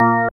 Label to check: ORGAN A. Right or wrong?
Right